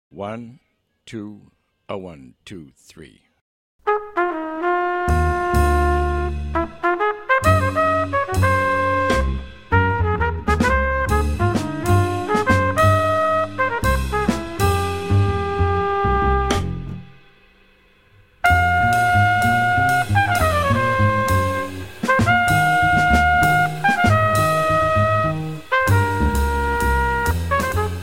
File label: minus piano